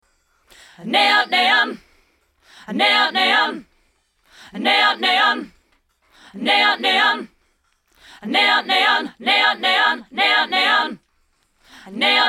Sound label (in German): chor